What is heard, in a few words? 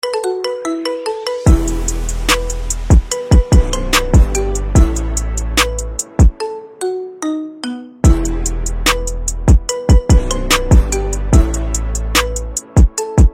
Marimba Remix